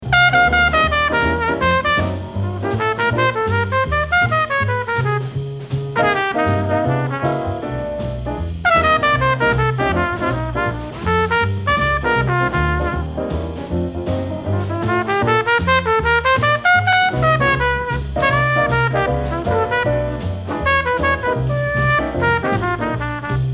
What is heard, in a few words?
solo